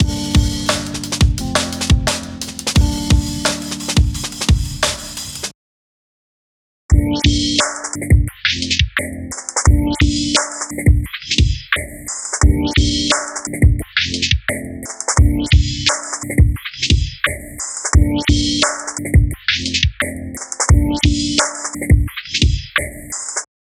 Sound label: spctrl experimental beat